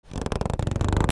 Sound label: HOUSE